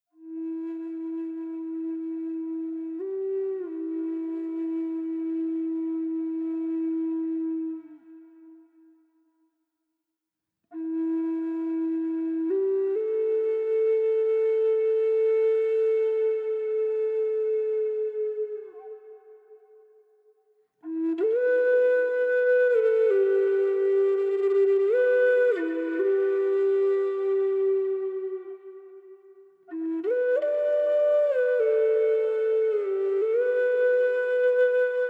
Sleep Flute, Flute for Sleep & Relaxation with Sounds of Nature